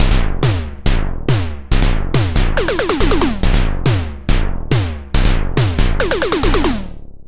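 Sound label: Electro Drum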